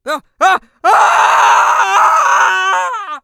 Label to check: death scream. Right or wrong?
Right